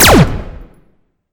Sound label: weapon; Blaster Mastery